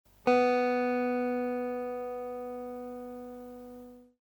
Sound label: gtr b, accordeur, guitare